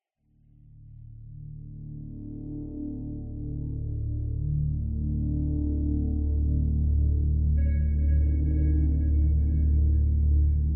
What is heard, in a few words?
ambient